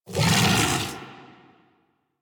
Kubrow Armored Vocal Alerted; Warframe

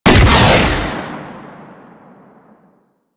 Arch Cannon Shot, Weapons; Warframe; Archwing

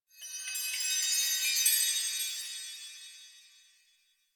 Chimes; Warframe; The New War; Narmer Outpost

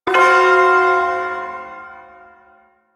Ersatz Shawzin Hirajoshi Chord; G; Warframe; Duviri Ersatz Shawzin

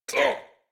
Pain, en; Warframe; New War; Teshin

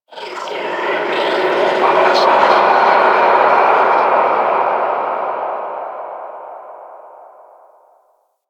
Moon Whispers Medium, Ambience; Warframe; Orokin

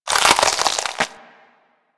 Limb Stretch; Warframe; Infested; Emissary Ancient Tank

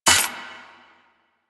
Scrap Spawn A; Warframe; Missions; Zariman; Zariman Assassinate

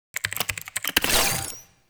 Computer Interact; Albrecht; Warframe; Quests; Entrati Labs

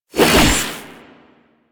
Briefcase Katana Spin Whoosh B; Crp Briefcase Katana

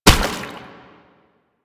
Step Water; Warframe; Proto Infested Brachiolyst

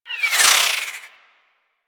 Warfan Projectiles Whizzby; Warframe; Tenno; Broken Frame

